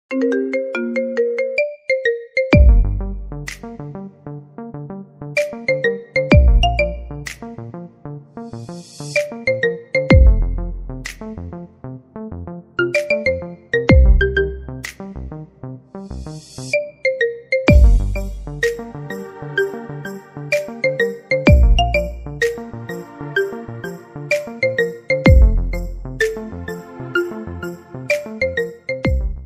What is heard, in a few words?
marimba ringtone